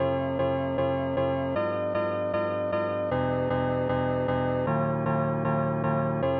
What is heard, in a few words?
piano; Pony Fan Music Remix Stems